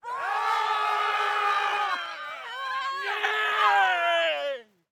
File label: Battle Cry, Charge, Screams, Army Attacking, Running; Medieval Battle; 344 Audio